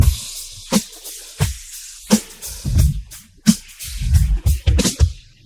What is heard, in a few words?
drums